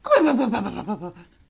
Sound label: ouch; rapidragdoll; foxie